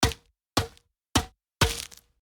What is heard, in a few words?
tennis racket hit low